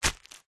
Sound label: foley footstep single on plastic sheet